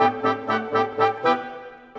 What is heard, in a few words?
Winds, Orchestral